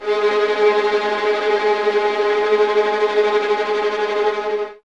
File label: VS G#3 tremolo, Violins, String Ensembles; Miroslav Vitous; 11 Violins